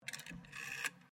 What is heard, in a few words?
TELEPHONE 1960S SINGLE PIECE ROTARY DIAL, old telephones